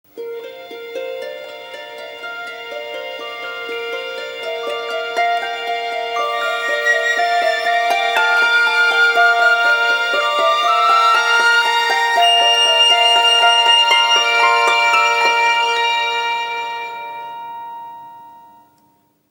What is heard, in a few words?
Alarm Piacevole; Vertu Constellation T